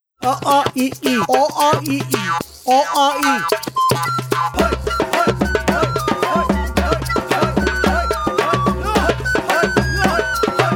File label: ringtone